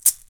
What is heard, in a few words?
Shaker, Percussion; Roland BK-5